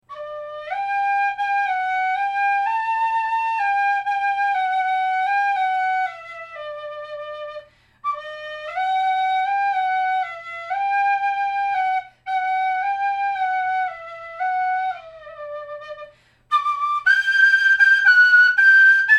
svirel plastik, svirel

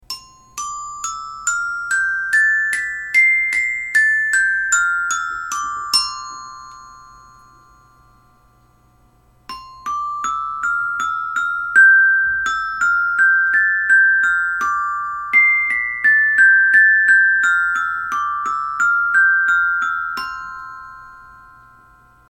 metallofon; metallofon otkrytyi diatonicheskii 8 not

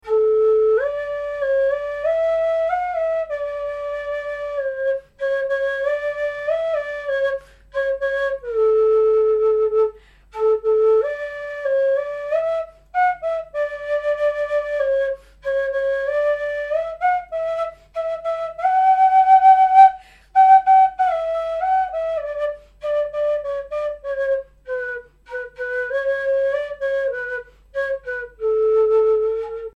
sopel yasen la; svirel ash

